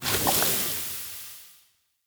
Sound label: Gas Burst; Ambience; Bromius Inside